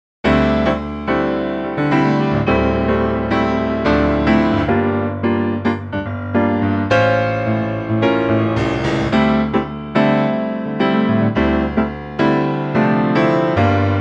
Dm Ebm piano

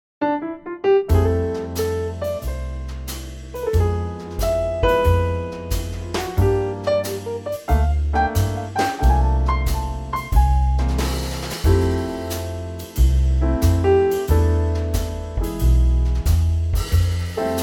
F trio

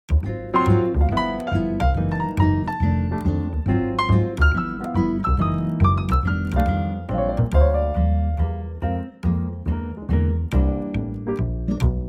Trio Bb

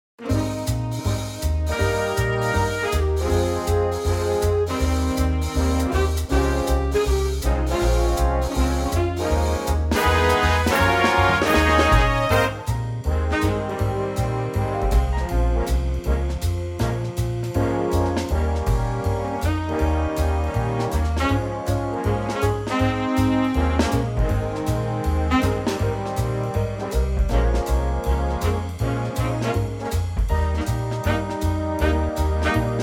Ab Big Band; vocal range - C to Eb; mid tempo swing or a jive tempo

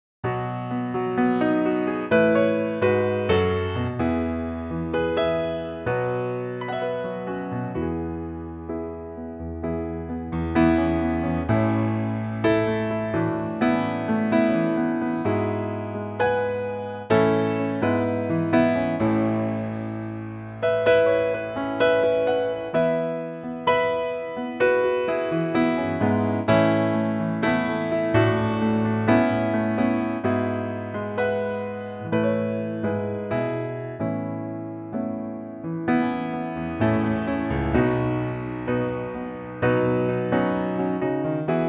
piano E; Pianotrak; key - E - range - B to E; in the original key and piano only arrangement.

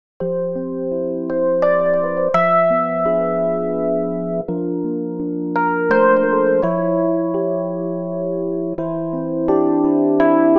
F 3/4 electric piano; key - F

